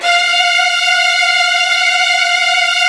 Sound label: VN TRILLS, Virtuoso Strings